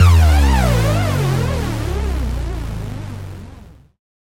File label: Bass Drop; SoundsCrate